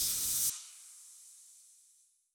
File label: mega shipyard electricity amb; Stellaris; federations